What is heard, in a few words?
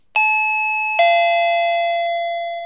8 Bit Doorbell